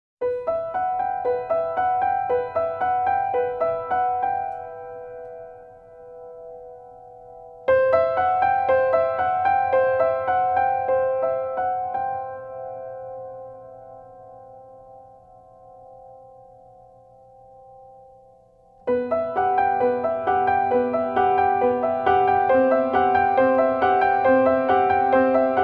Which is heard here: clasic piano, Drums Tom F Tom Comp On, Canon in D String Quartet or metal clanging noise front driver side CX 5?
clasic piano